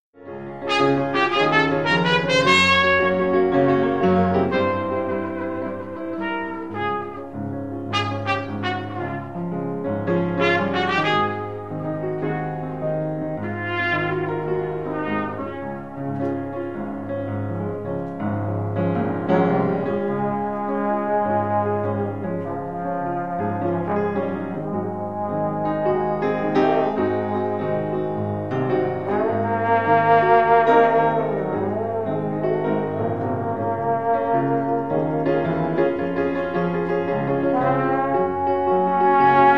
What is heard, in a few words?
Jazz Band